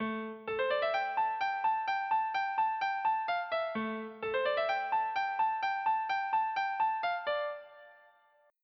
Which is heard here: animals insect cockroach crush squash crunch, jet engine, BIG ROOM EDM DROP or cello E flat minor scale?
BIG ROOM EDM DROP